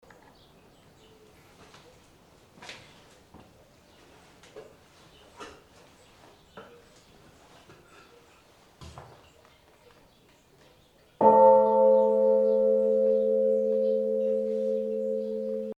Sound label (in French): cloche; St Gaudens